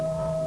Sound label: Motor, Train; OpenBVE